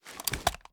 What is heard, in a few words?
sm uzulu reload fast end; gmod; iw8